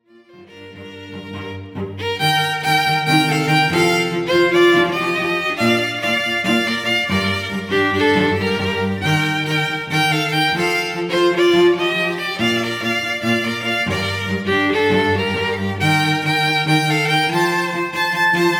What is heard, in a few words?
String Trio